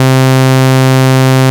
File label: Synths C; vengeance essential club sounds-3